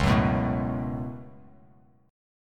C sharp 7th Suspended 4th Sharp 5th, Bass Standard; C#7sus4#5; strummed; JGuitar